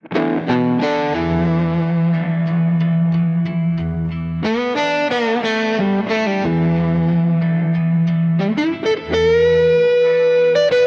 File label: Ethereal Waves